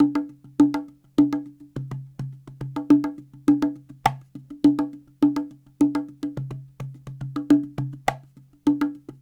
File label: Cahon